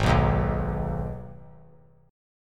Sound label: G sharp Minor G sharp down, chord; G#m strummed; JGuitar